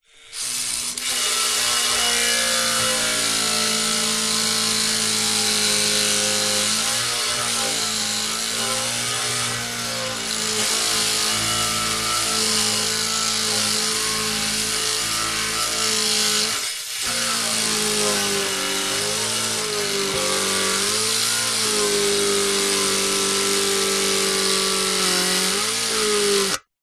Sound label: industrial power tool grinder on metal buzz